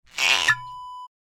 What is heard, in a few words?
cork squeak